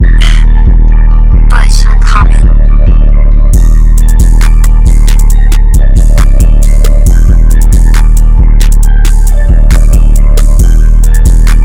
136bpm Dm

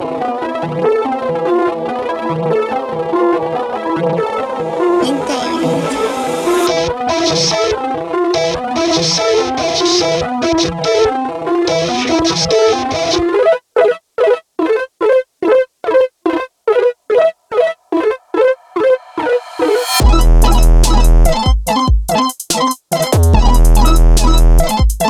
bass

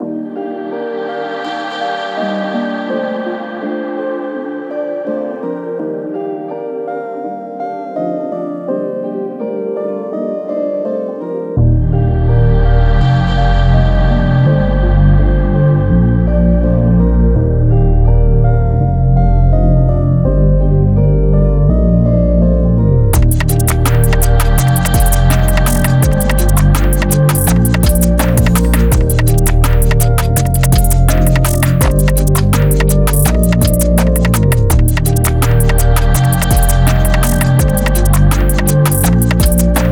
Type Beat

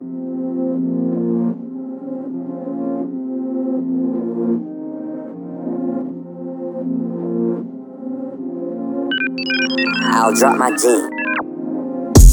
trap A♯m158

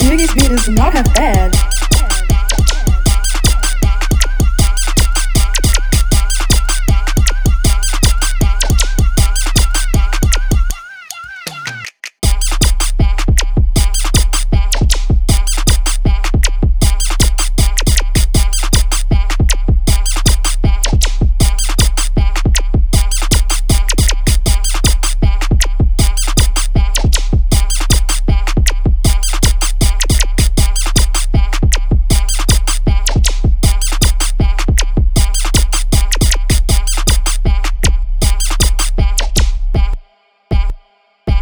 BPM 157 C min Type Beat

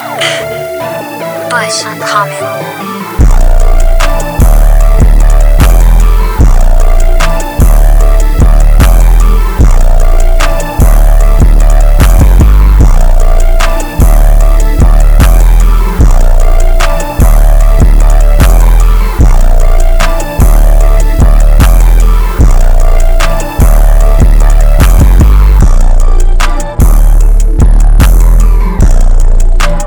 150bpm Dm

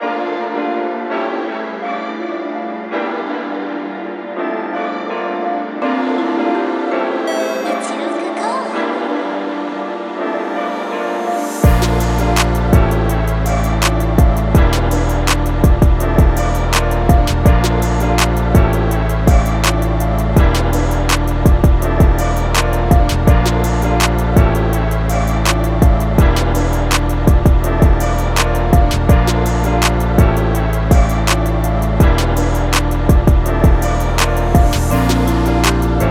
Dm BPM165

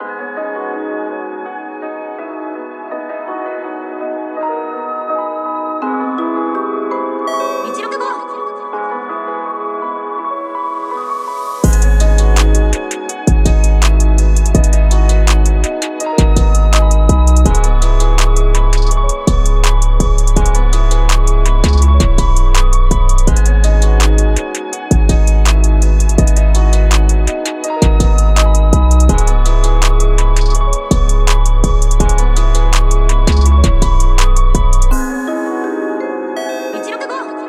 Gm BPM165

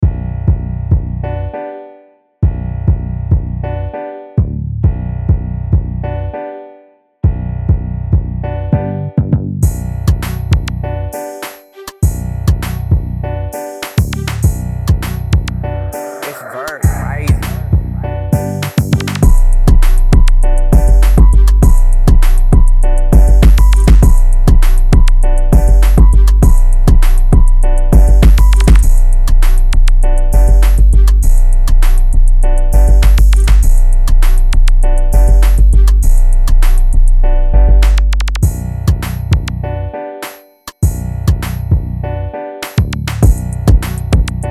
bminor 200 type beat

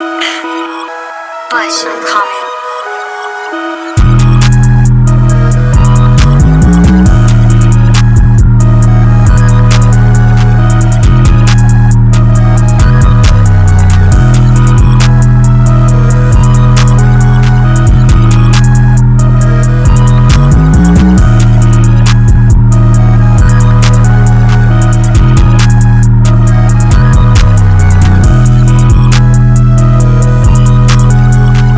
136bpm Dm

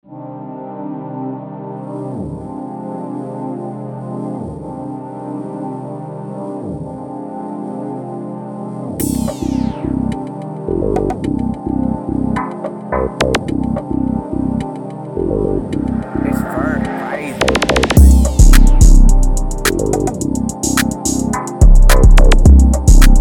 107 cminor type beat

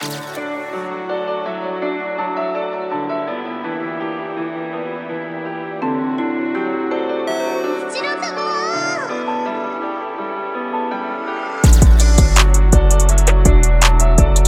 DM BPM165

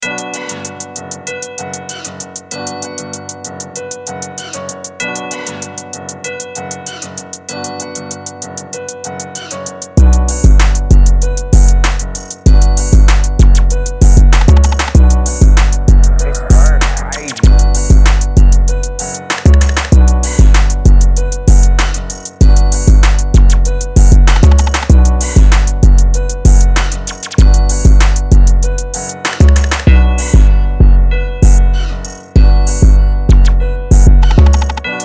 B minor, type beat

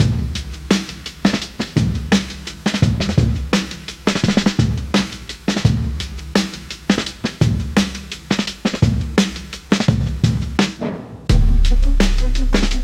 aggressive beat hip hop

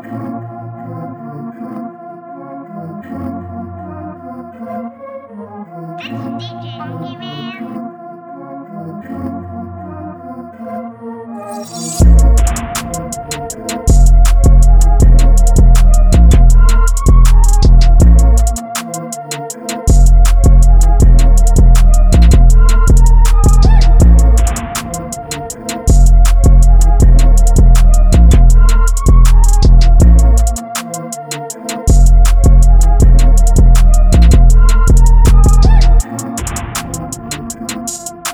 dark type beat bpm 160

